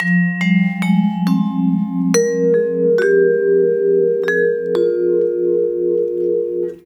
Lounge Vibes, loops

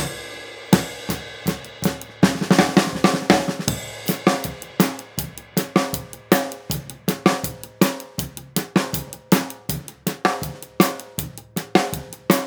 Tom Over

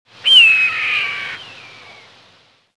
redtail Hawk, desert Sounds

